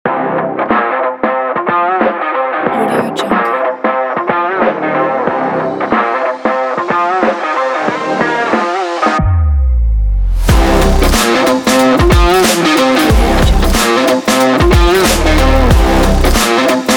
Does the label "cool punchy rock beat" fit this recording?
Yes